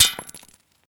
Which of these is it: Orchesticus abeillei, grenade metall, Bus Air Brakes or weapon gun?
grenade metall